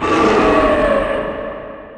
Pain

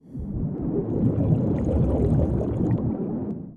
Death Bubble

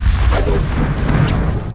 Firebust

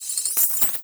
Attack; Alquamos

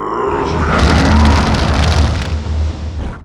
Attack

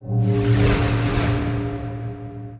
Great Shield

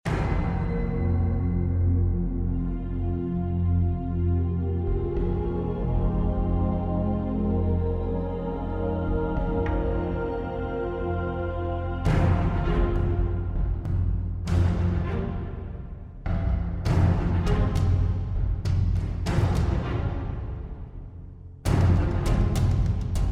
fight music

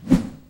attack

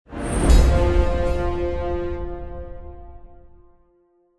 fight end

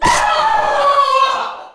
dark elf death

